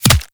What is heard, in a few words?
Player Bullet Impact Flesh; Warframe